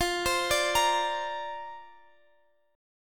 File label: C 7th Suspended 2nd Suspended 4th F